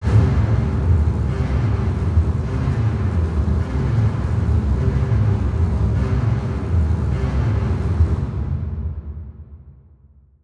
Adrenaline Strings Low, horror strings

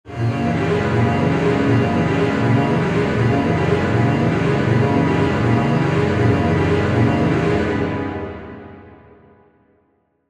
Chase Strings Mid, horror strings

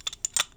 Pipe Wrench; Smol Mechanisms

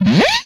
Sci Fi Gun, retro arcade; Guns; MMRetroArcadeSoundsPack

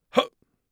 hut, Mage Voice Pack

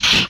Gun; MMRetroArcadeSoundsPack

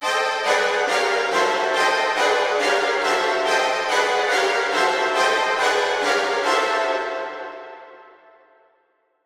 Intense Strings High, horror strings

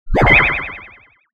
Retro Magic Protection

